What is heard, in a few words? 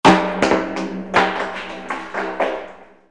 rockslide; KABOOM! - Wild and Wacky Sound Software; Outdoors & Nature; The Elements